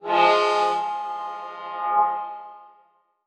Transition Bell Swell; Production Element Title Transition

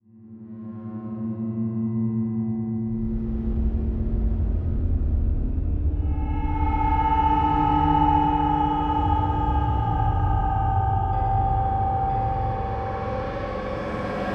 Slow Swell Dramatic; Adobe; Production Element; Imaging

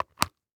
Foley Footstep High Heels Concrete Single Step Up; Foley Footsteps

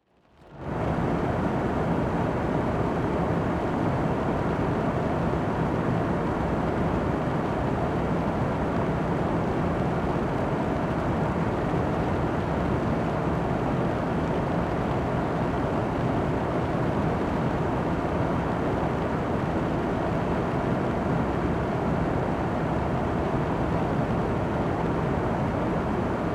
Car Idle In Rain Heat On; transportation

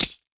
Emergency Handcuffs Dropped In Leaves; emergency effects; AdobeCC

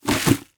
Industry Dirt Scraped Into Bucket

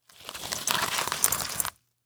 Foley Metal Drag Through Leaf Debris

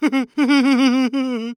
Cartoon Human Male Laugh Nerd Laugh; AdobeCC

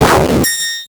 Transition Blast Distortion

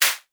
Click Mechanical; Multimedia Internet CD-Rom Flash

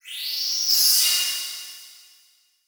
Transition Feedback Ring; Adobe; Production Element

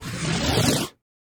fwamf medium, Transitions; CinemaSpice; Mograph Mini Transitions

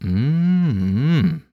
Human Male Mmm

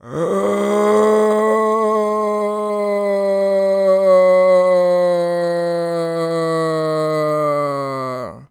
Human Male Moaning Old Man

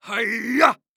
Human Male Karate Yell Deep Hi-Yah

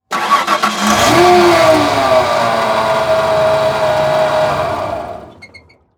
Truck Ford Ranger Start Dies; transportation

